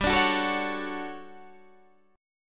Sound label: D-Major 7th G; DM7/G strummed